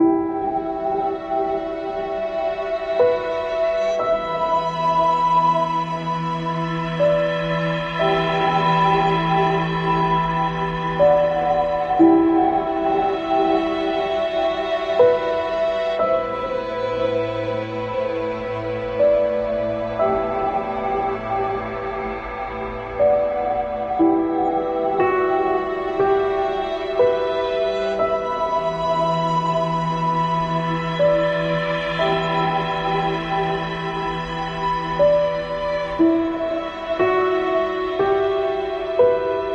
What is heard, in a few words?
Ambient